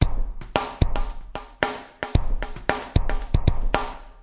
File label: 113.5 bpm, rock echoes, drum loops and breaks